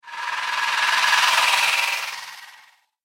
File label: Reptilian Whoosh Sound; Whoosh Sound Effect